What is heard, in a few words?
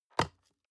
box small open soft; industrie wirtschaft; bueroarbeit